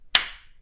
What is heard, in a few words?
clunk; god mode